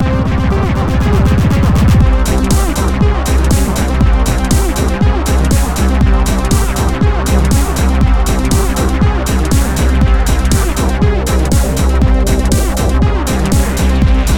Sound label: mbsid psytrance